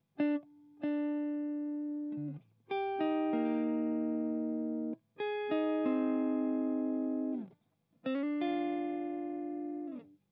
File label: SOLO CLEAN